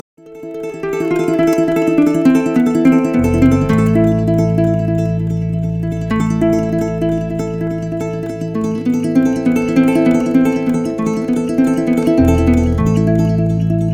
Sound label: Ac Bass